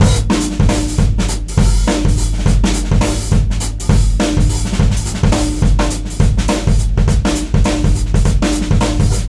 DRUM 103

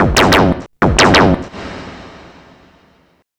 CARTOON, Hard & Loud Techno

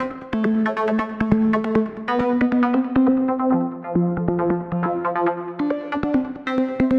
synth loop, drum n bass

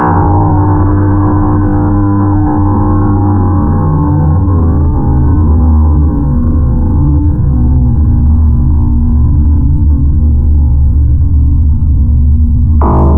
texture hummer

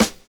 SD, Drums Dance & Groove; USB Soundscan vol.11; AKAI

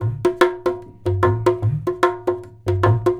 DHOL, Indian Traditions; USB Soundscan vol.45 - Indian Traditions; AKAI

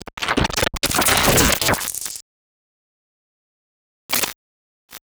glitch

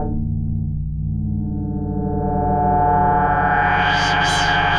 Fireworks Swoop; Sonic Foundry (Sony Creative Software); Ma Ja Le Ethereal Textures

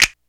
SNAP, Hip Hop; E-MU Formula 4000 Series Vol. 1; Hip Hop Nation; Bonus Perk

